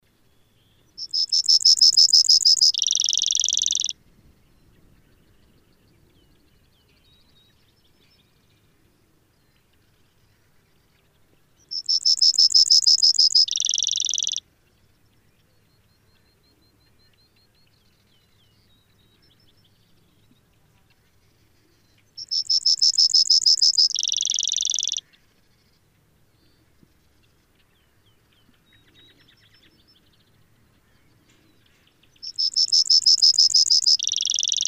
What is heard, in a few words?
brewers sparrow, sierra valley